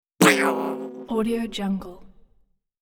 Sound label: comic spring hit